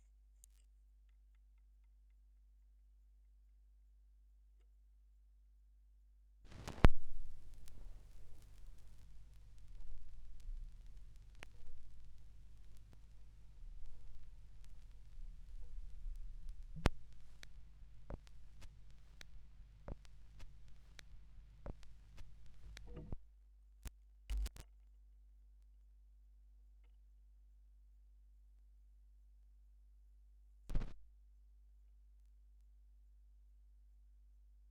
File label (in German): Schallplattengeraeusche